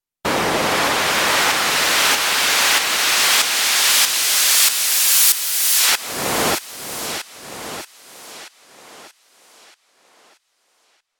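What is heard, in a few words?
pump Noise Syn; KP3+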